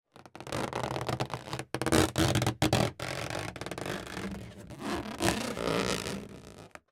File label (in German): quietschen